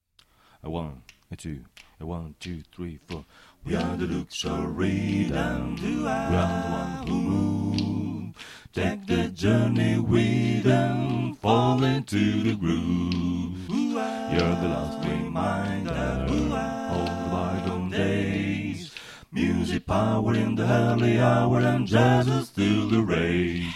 Tenor, barbershop tags

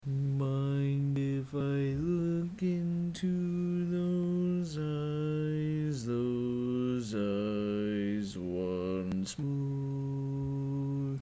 Bass, Barbershop Tags

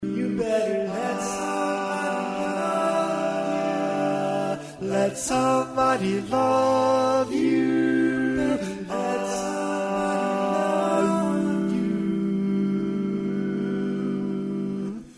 Bari, barbershop tags

